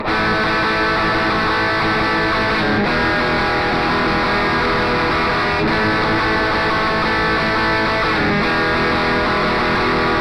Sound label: outro Crunch